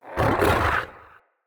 Wolf Attack Growl Spawn; Orengall; Animals

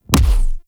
Quick Draw Punch; weapons; Warframe; The New War; Omicrus

